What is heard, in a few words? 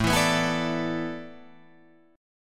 A Suspended 2nd Suspended 4th down Guitar Drop D